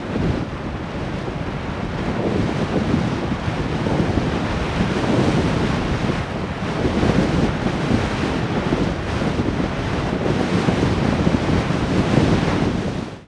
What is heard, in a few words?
wind; dystopia; vaccine